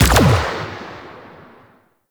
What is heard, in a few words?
Turret Fire B; Enemies; Weapons; Warframe; Arachnoid Camper